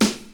90s sparkly hip hop snare sound a key, Royality free snare sample tuned to the A note